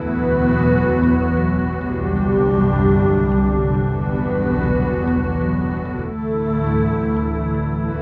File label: Big Fat Finish, Acoustic Drone; Sonic Foundry (Sony Creative Software) - Friendly Fire; DroneLoop